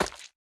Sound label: Slime Impact Large